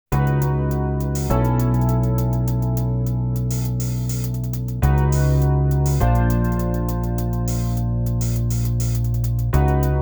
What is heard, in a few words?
Generic Music (Hideout Embassy); eve burst error original soundtrack 1998